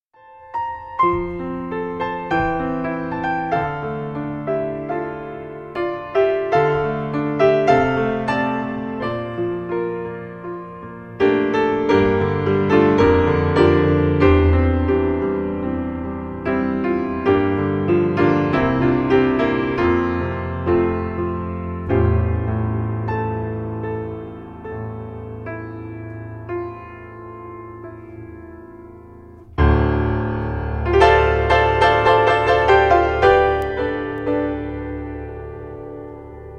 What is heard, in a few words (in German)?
Vater Unser Instrumental